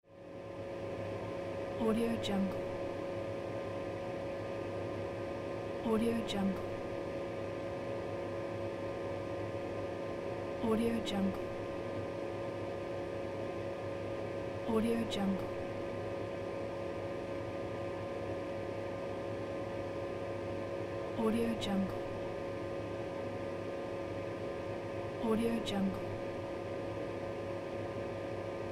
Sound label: machine; mrsoundfx